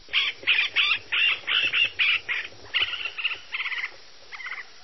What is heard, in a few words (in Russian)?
krik shiloklyuvogo dyatla s zatuhaniem